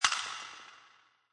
Glass Breaking Reverb, Eerie sound of glass or ceramic shatter, break; with heavy reverb; Lynne Music